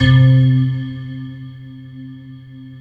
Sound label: C2, Synth; MARQUISE; HIMARKS